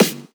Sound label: Snare; Vengeance Samples